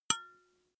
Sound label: glass metal small impact damped